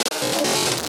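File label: drum beat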